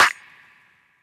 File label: clap; eddyflux; dough-samples